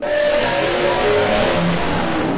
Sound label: screamer; withered golden leopold